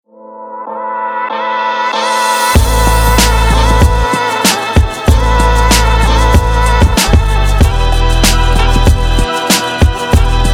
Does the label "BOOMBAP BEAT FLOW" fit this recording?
Yes